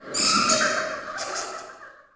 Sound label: bat; DAoC